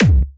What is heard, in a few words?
kick